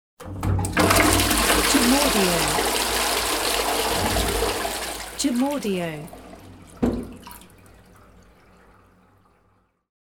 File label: Toilet Flush